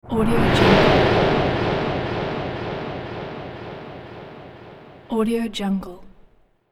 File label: vortex time pull